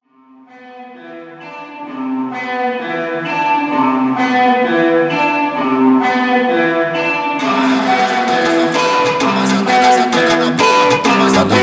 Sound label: Funk